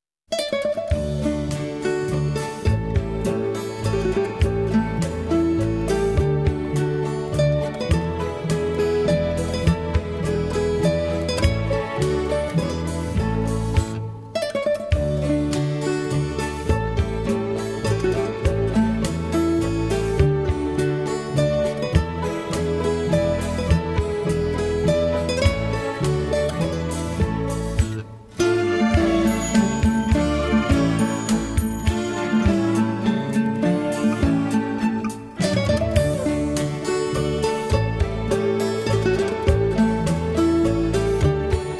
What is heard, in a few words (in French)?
Menuet, guitare; spanish guitar